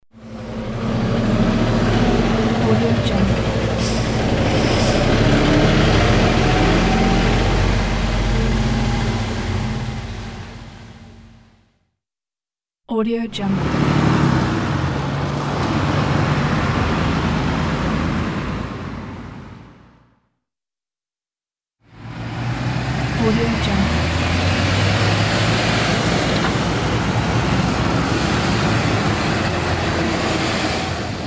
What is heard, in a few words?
truck pack; soundfoodsfx